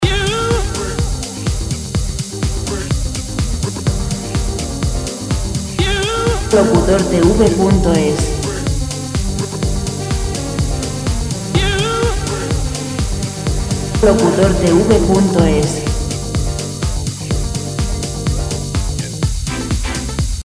125 dance; vocal

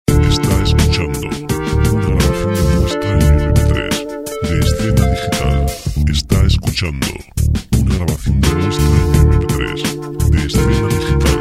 sintetizer medio 55; Música para audiovisuales; documentales

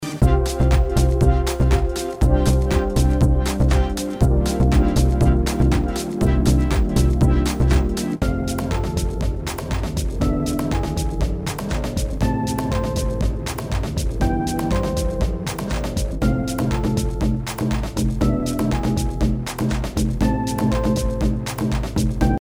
126 dance

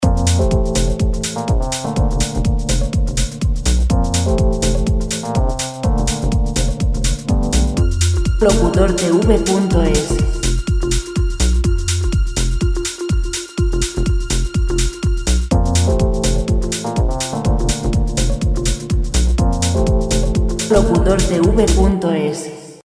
124 dance

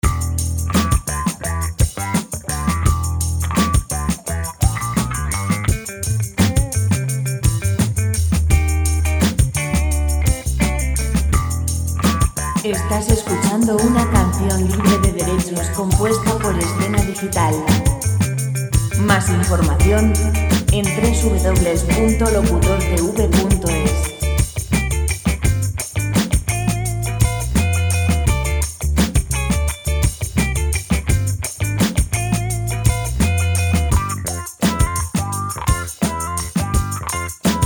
guitar rock; canciones sin copyright

